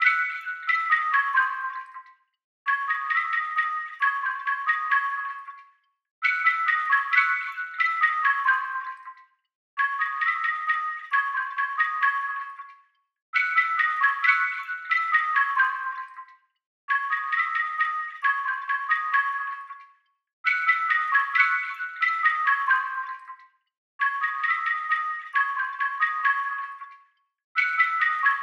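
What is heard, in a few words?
Carribean Piano, C minor, Dry, 135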